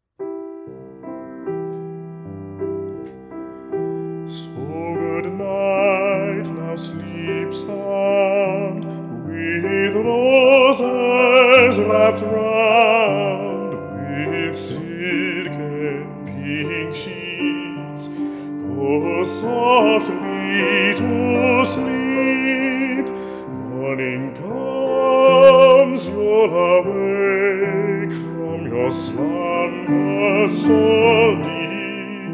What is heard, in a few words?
Cradle song Wiegenlied